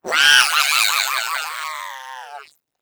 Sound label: egg fling; player; Portal 2; eggbot